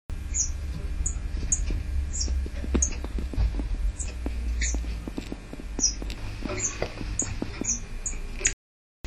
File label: call twitter, lavender waxbill; Call 3 - twitter; Lavender Waxbill finches